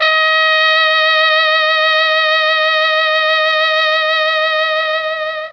D#4 TRMB TRMP, Mellotron; Mike Pinder - Mellotron Archive CD; TROMB+TRMPT

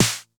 SNR, Cheez Snrs

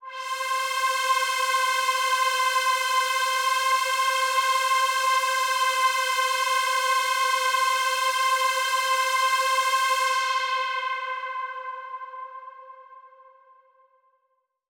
Big Pad C4; KG MegaSaur

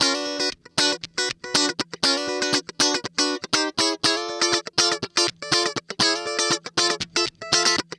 Guitar Licks

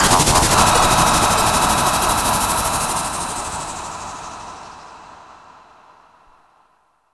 SPACE-FX

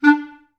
STAC D3, Orchestra; Best Service; Peter Siedlaczek's Advanced Orchestra

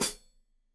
Hat Foot, Hi-Hat, Cymbals Stereo

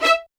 ATT E5, LOUD VIOLINS; Q Up Arts; Denny Jaeger Master Violin Library

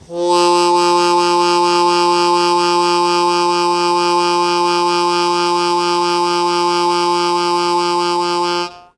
Long Sustain Fast Wah Wah F0, Harmonica; Zero G - Harmonica

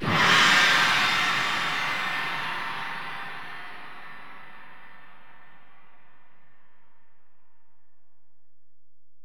Ride, Alesis DM-PRO, Drum Machines

